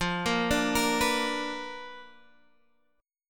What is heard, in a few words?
B Minor Major 7th Flat 5th, chord